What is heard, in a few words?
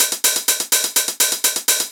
909 Hats Loop 125, Old Skool House